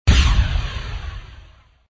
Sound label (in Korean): 미사일 발사; deepsky